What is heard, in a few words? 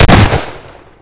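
double barrel, weapons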